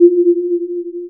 nonoverlapping

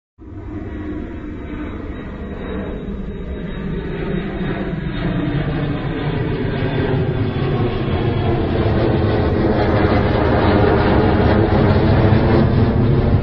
jet airplane passing overhead

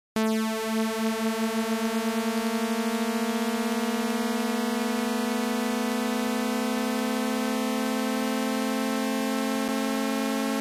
2 octaves 2 sawtooths

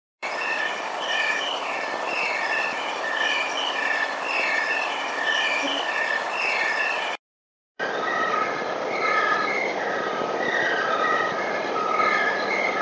many crickets speed

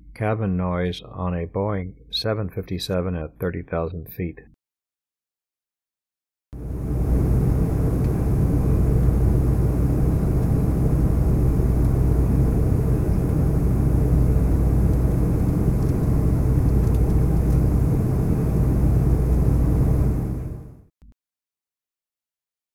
Jet cabin noise seat

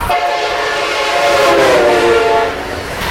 train doppler

